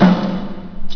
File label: Snares, Real